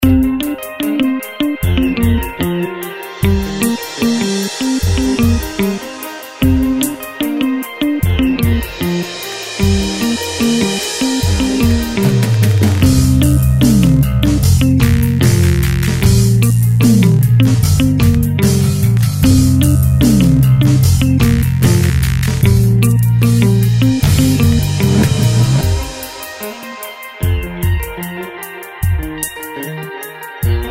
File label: Surf Guitar; dramatic